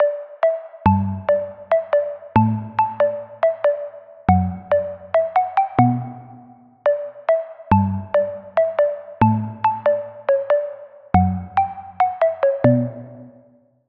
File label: Pluck; Routed Sound; Sylenth1 Expansion - Flame Summoner Vol.1